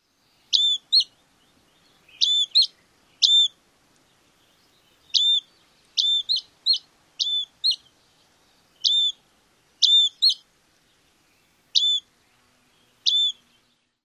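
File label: Dusky Flycatcher call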